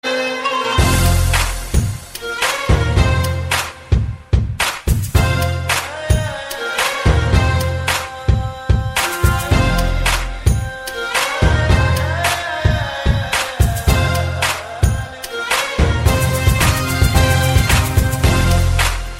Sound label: arabic trance